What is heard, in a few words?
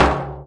arabic perc, Arabic Percussion Kit